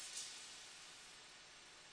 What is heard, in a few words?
TADA